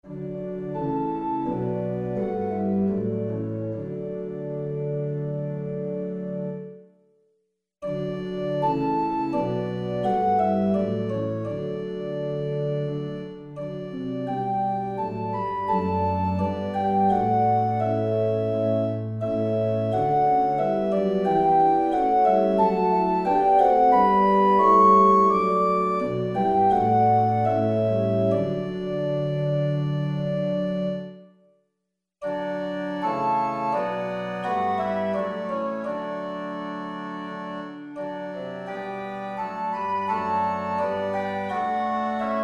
Organ, hymn; Breathe on me Breath of God; Hymnal 1982

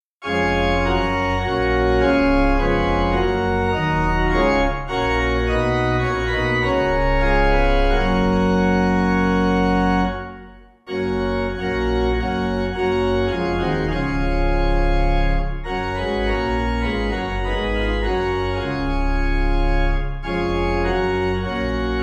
I Love Thy Kingdom Thomas Pipe; Hymnal 1982

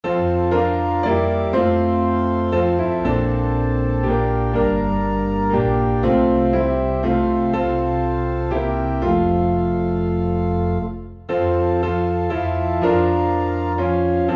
Piano and Organ in F, hymn; Breathe On Me Breath of God; Hymnal 1982

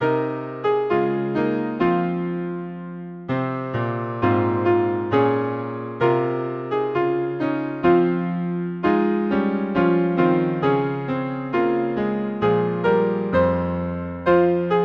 Hymn, piano; Take up your Cross the Savior said; bourbon

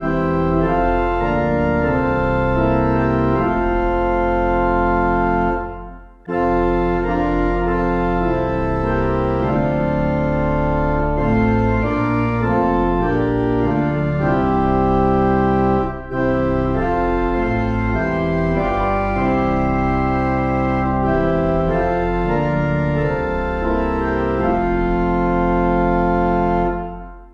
organ, hymn; quam dilecta; Hymnal 1982

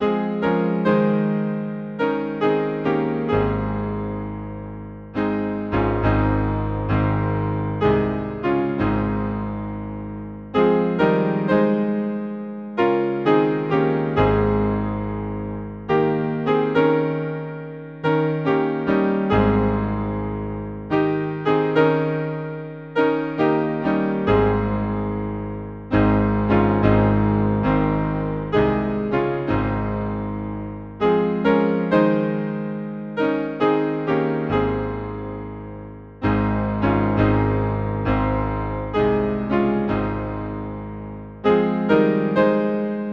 Hymn, piano; Rock of Ages; Toplady

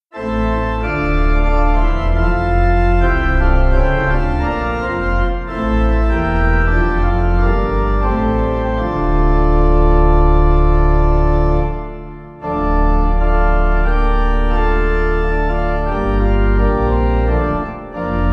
organ 4vs; detroit; Hymnal 1982

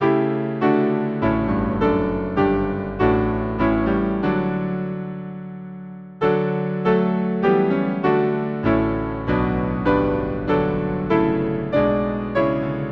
Hymn, piano; O Spirit of the living God; Melcombe

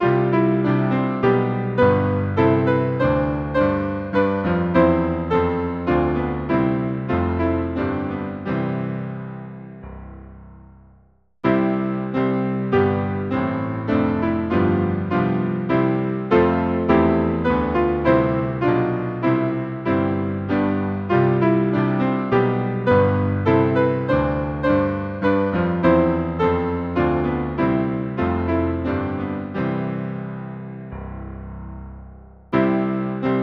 Hymn piano; In your mercy Lord you called me; Halton Holgate

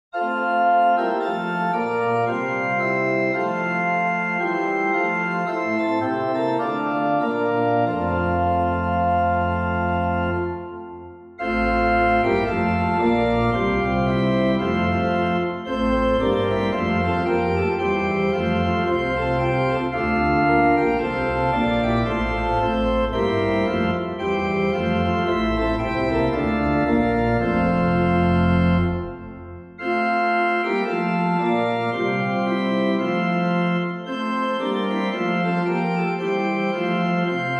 Organ, hymn; 3 Verses; Love Came Down At Christmas; Gartan; Hymnal 1982

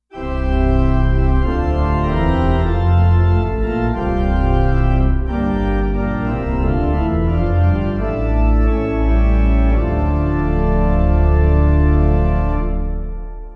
Hymn Pipe; Bread Of Heaven; Jesu Jesu; Hymnal 1982

